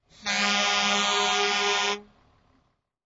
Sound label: fire truck air horn